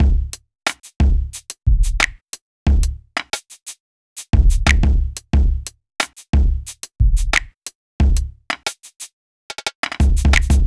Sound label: Urban Beat, Drums